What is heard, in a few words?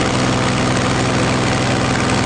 Plane Prop Loop; Airplanes